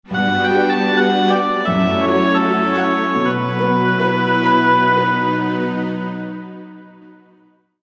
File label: JINGLE Item Get; Monster Girls and the Mysterious Adventure 2; windows